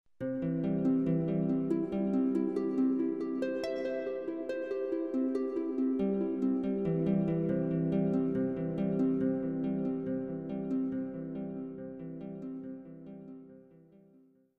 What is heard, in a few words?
THEME harp; Myst III Exile